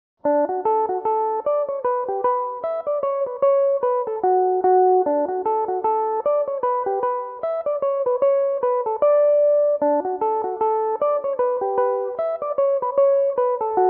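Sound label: D major, mandolin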